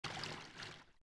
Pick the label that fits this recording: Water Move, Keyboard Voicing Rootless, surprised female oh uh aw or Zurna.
Water Move